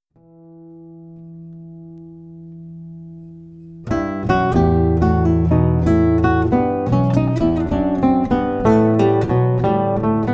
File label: funkadelic bourree; bach